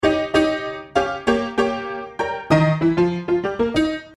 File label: Ragtime SMS; Sms Töne; Klingelton